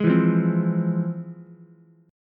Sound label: F Minor Major 7th, Ukulele, chord; FmM7 strummed; JGuitar